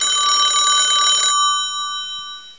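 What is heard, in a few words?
phone ring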